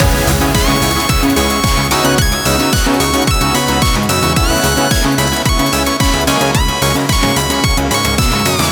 chorus, coming together in the first chorus along with the lead melody; Rhythm Quest